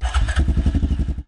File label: start Generator; Zomboid 41.78.16; Project Zomboid